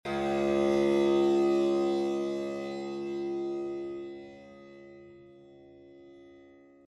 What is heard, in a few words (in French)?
tampura tonique; Latcho drom